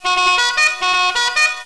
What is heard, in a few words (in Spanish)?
Equalizer